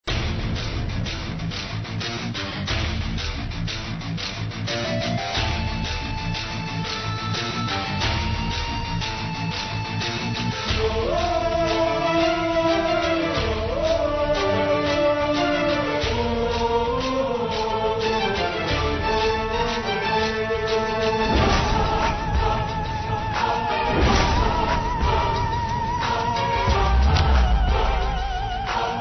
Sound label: Sad Tune